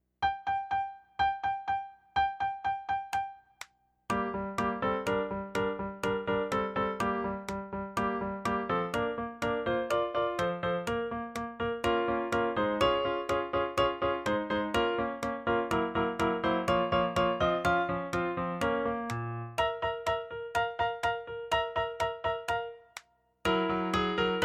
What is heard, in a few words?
Piano 124